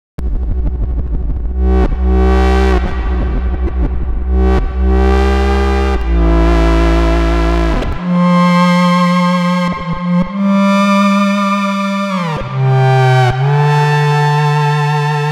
Bass Synth; Neural DSP Archetype Rabea; Overlord

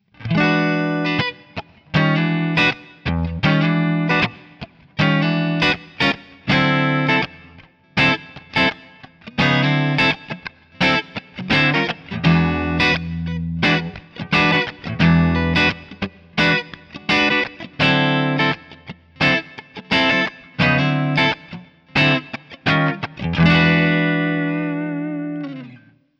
Kemper Profiler Player Fan Twins

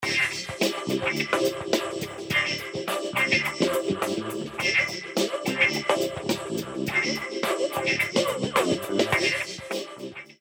vocoder nature fantastic; els vocoder eiosis

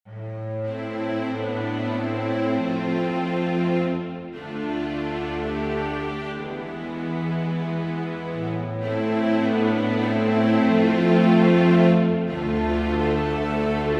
String Ensemble

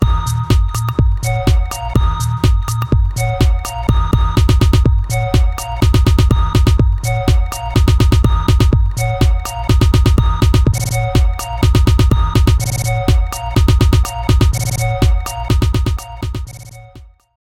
Beat Slice remix

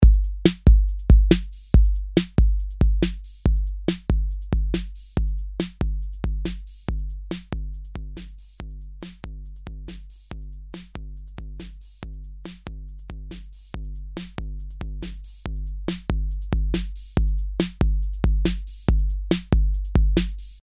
Pre Amp + EQ + Comp

